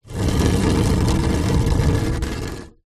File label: foley insulation tile drag ground; zapsplat